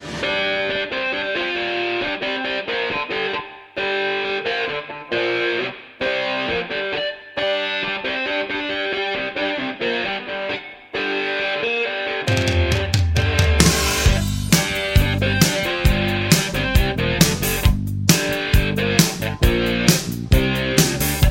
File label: Les Paul 59